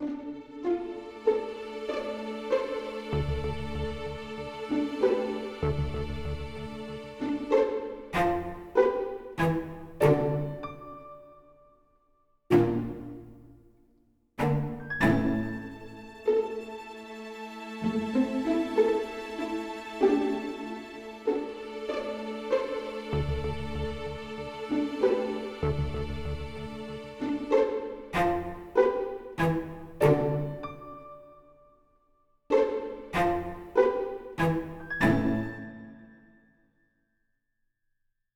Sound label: Walking Melody Loop; bakugan champions of vestroia 2020; switch gamerip